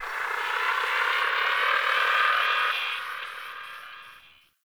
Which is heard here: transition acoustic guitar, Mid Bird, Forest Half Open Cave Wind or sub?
Mid Bird